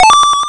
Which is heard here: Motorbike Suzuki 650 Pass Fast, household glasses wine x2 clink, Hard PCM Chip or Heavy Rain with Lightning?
Hard PCM Chip